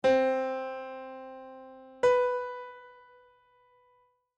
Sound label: music intervals major seventh